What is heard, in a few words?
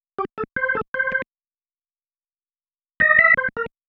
Single Riff, Organ